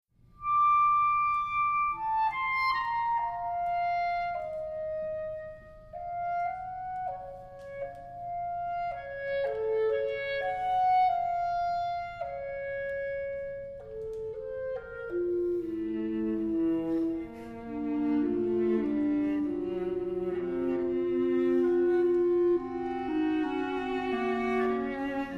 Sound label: Trio; Halcyon Trio; Gordon Jacob